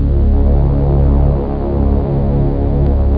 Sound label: lo hum